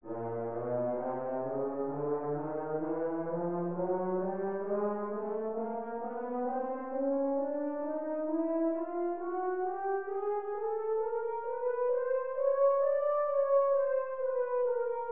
Horn Chromatic

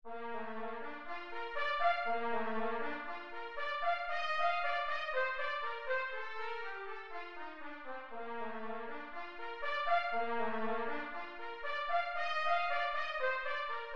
Trumpet Slow

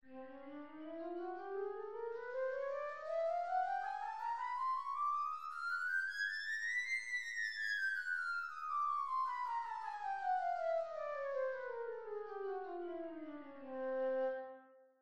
Flute Chromatic Scale

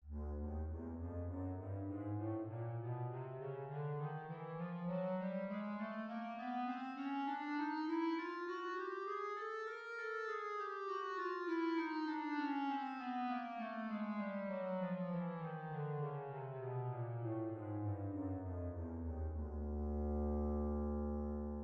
Bass Clarinet Chromatic Scale

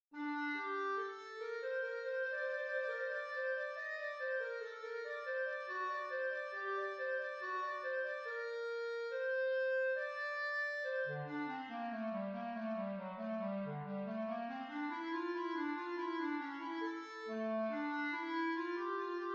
Clarinet Excerpt

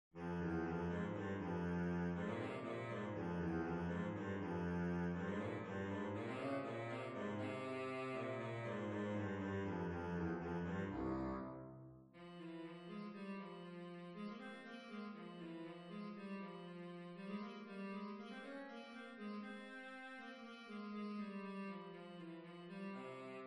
Bari Sax Track

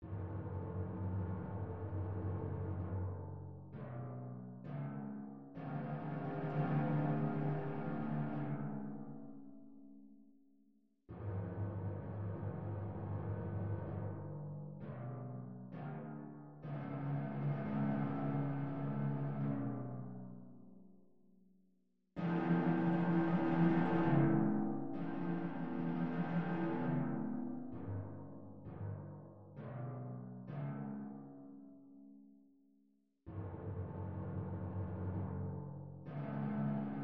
Timpani Track

